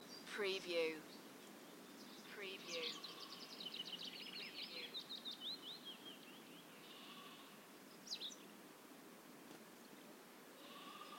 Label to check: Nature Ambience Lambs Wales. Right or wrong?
Right